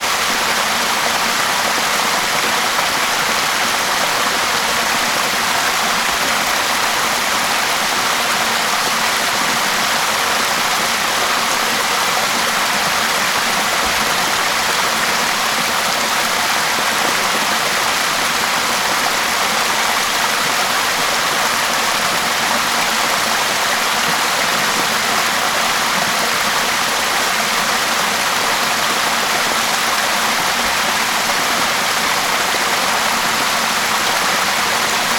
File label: water waterfall rockface forest; sound effects one